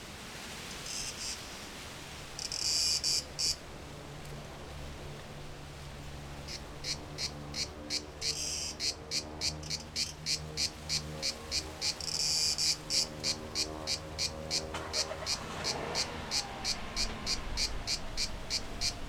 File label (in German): Zikaden; Greece; Fieldrecordings; Parasporos Abfahrt 2